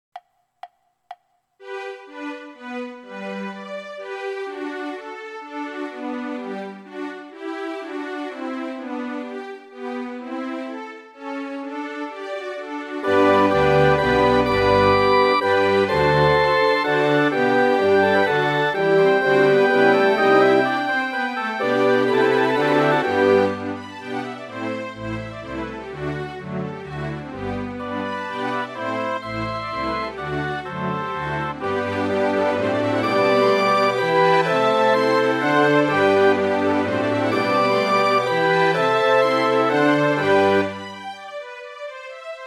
fl konzert orchester; mozart; フルート用伴奏CD

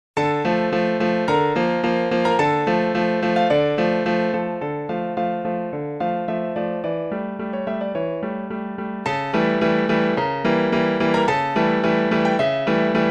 solo de concert, oboe; オーボエ用伴奏CD

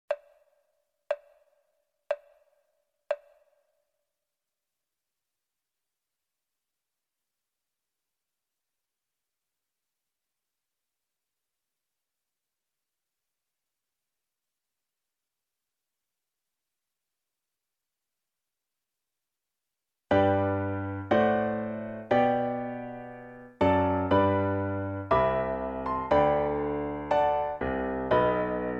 romanze g-dur, violin; beethoven; ヴァイオリン用伴奏CD

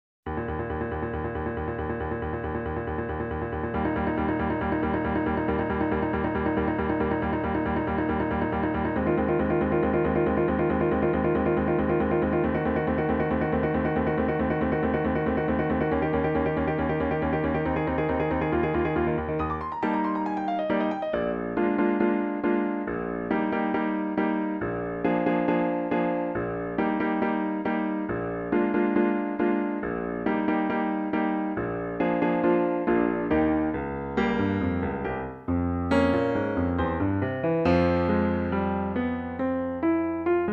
clarinet sonata; saint-saens; クラリネット用伴奏CD

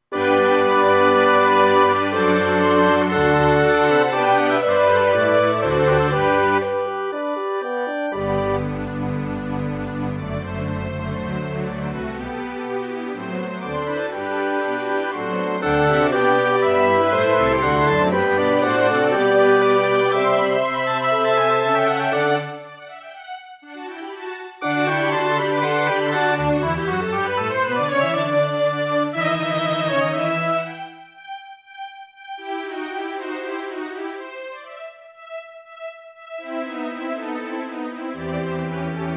fl konzert orchester; mozart; フルート用伴奏CD

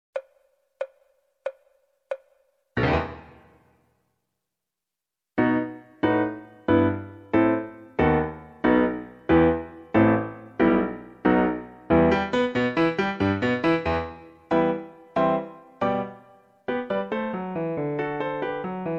basson sonata, fagott; saint-saens; ファゴット用伴奏CD

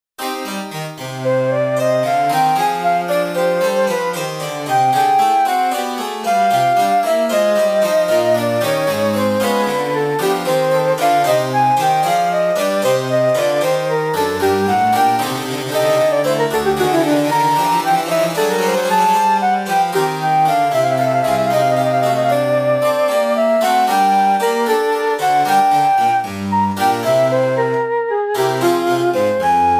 bach trio sonata; violin

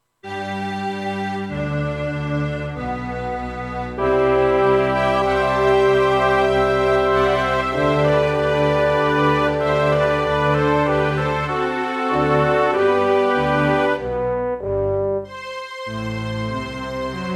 fl konzert orchester; mozart; フルート用伴奏CD

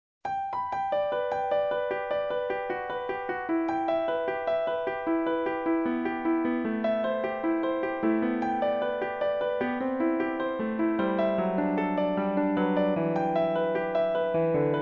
basson sonata, fagott; saint-saens; ファゴット用伴奏CD

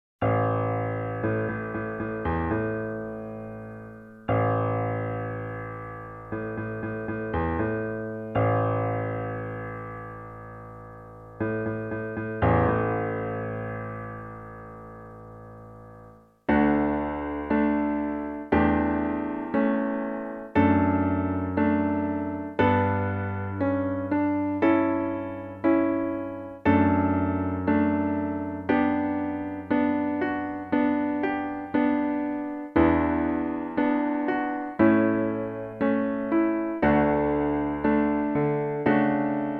oboe; フルート用伴奏CD

